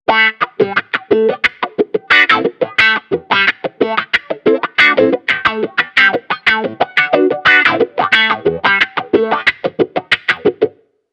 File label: Wah